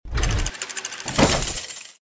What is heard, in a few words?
safebox open